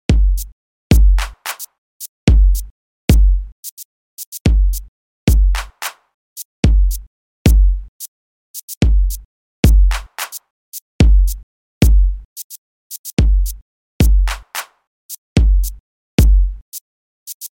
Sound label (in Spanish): musica